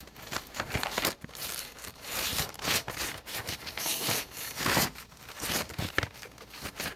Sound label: rummaging through backpack with plastic crinkling